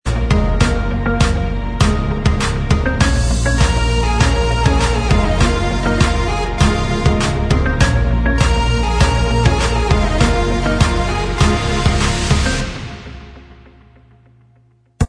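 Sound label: instrumental, backing track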